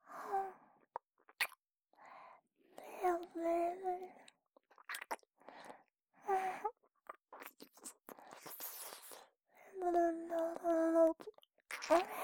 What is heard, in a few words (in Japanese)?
耳舐め 右耳; お姉ちゃんのお耳ふーふー、お耳ぺろぺろ; なつこん